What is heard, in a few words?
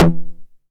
909 SD Tape Sat